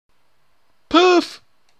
POOF

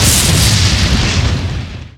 lightning spear cast

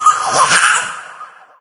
chatter combat, cherub; doom3

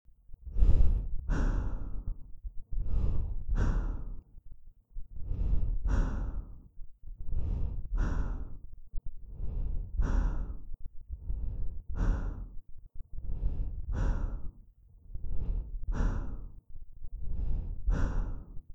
mask breathe; snds jack gmod

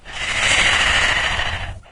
breath, imp; doom3

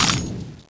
clip out; tfa cso; m249p

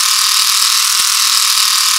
valve noise, simulated vehicles

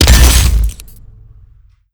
gauss siege fire; doom gauss

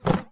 box open

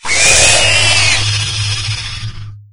imp death; doom3

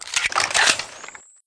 laser rcw reload; weapons

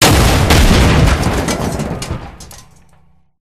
gunstand destroy, turret; gow; turret judgement

